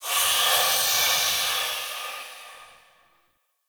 Town Steam Release; Ambience; Warframe; Duviri

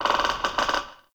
Tree Pine Branch Creak; Ambience; Trees

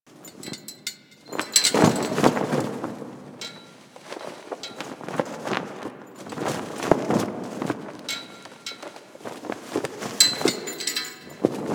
Flag Loop Medium; Ambience; Props; Loops; Warframe; Eidolon Plains

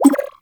Blessing Thank You Bubble, UI

